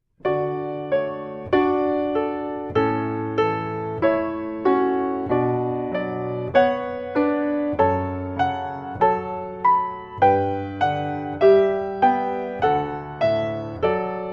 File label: canon, solo piano; wedding music